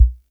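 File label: SR95 bass drum; Best Service; Dance Mega Drums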